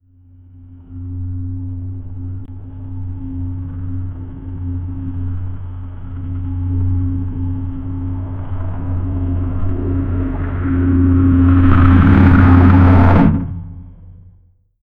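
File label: detailed soundscape, driving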